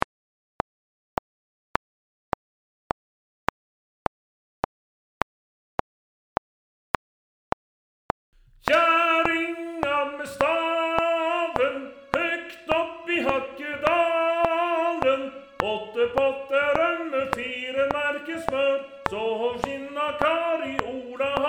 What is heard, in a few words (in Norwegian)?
lydspor Tenor 1 Click; Kjerringa med staven clicktrack